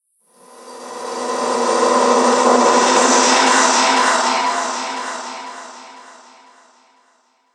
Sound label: Scary Creatures, monster sound effects; Fantasy Sound Effect; Andrew Fleming; Twistedtracks